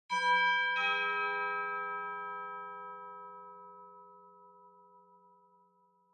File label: Doorbell Mech Tone High Far